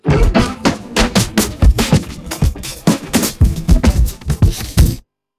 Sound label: breaks